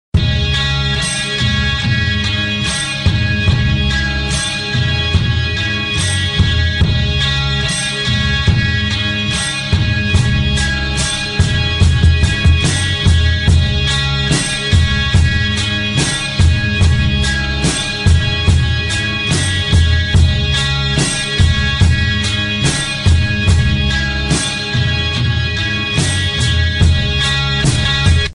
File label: underground rock